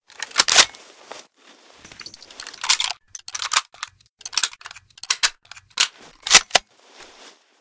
1903A1 Unertl reload, sniper rifles; 308

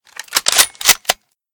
1903A1 rechamber, sniper rifles; 308

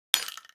12cal shell metal, shotgun; weapons sound; bullets

